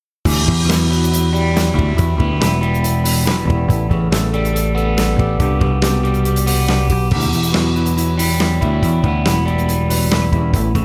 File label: gibson drive